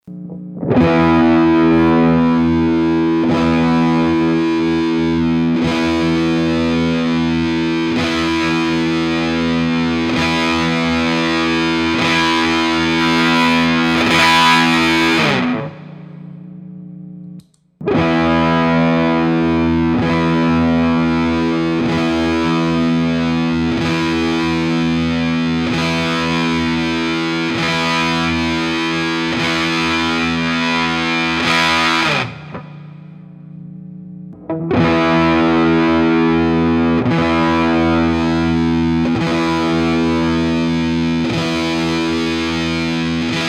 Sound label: Musket V8 Russian Muff TONE RANGE